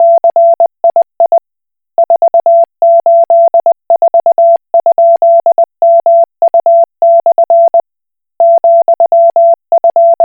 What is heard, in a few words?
Koch Morse